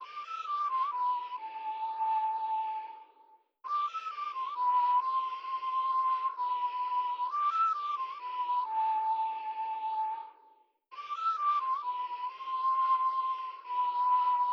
whistle, 132 BPM F Min; Life

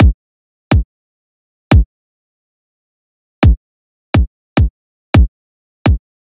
Riddim Drum Loop Kick (140); Drum Loops; Ghosthack; Riddim Essentials 2020

